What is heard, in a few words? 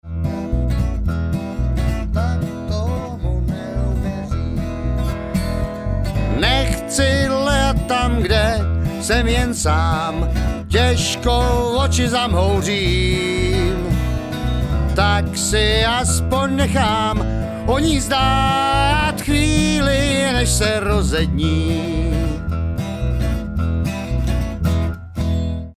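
vocal Lead, bluegrass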